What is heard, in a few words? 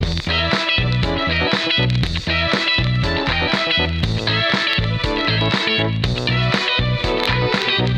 Turntables Disco